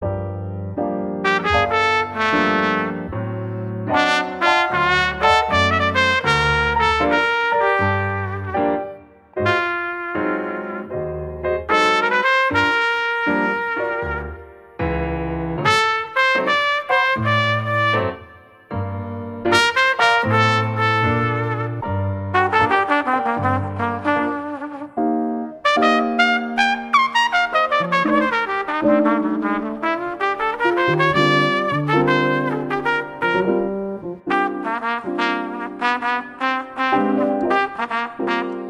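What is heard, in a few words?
jazz trio sounds coffee break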